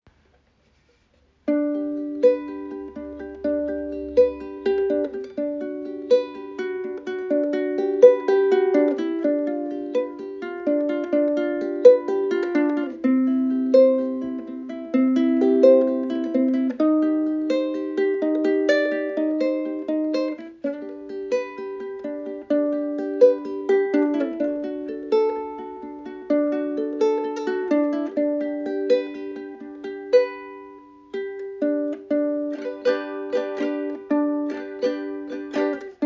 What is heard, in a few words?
Palo santo ukulele